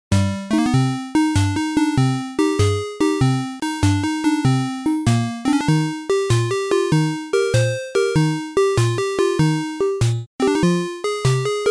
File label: 8-bit; Kazooie